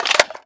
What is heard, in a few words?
m16 mag out, weapons